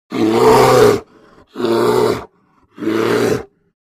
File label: Bear, Grizzly Roars. Multiple Roars With Breaths. Close Perspective.